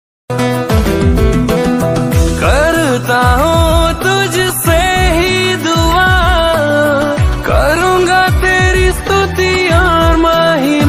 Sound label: christian song; yeshu; hindi